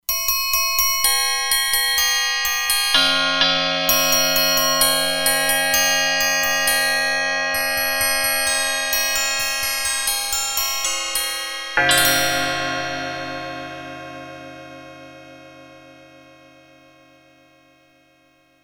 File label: Triangle, E-mu Proteus-2; Factory Presets